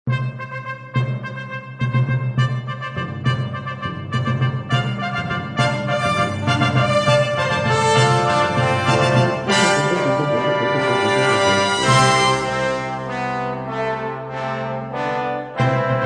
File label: Brass Choir, Fanfare